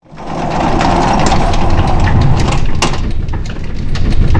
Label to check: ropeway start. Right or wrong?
Right